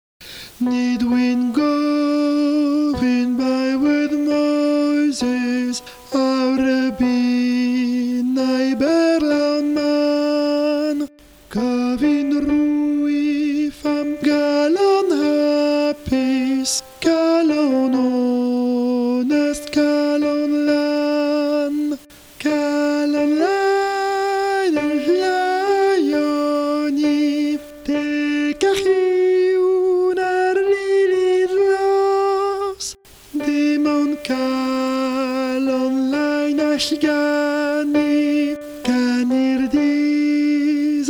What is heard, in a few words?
calon lan alto